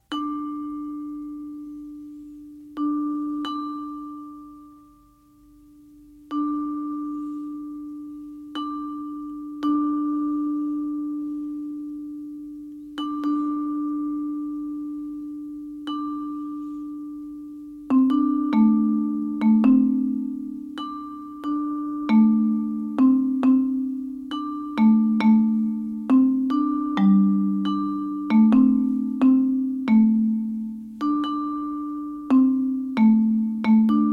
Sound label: Percussion